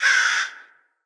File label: Dark Army Archer Crows Nest Attack